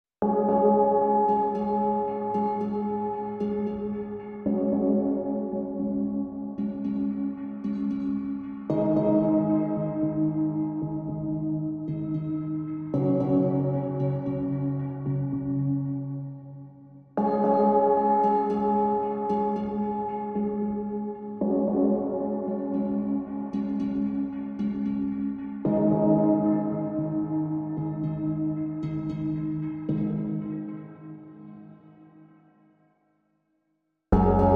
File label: No Fast Hang Drum